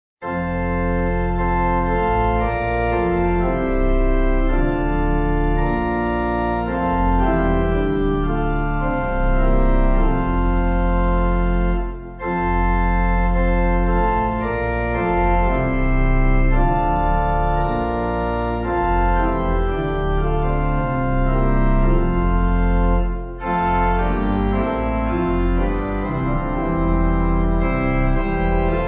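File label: Pipe Organ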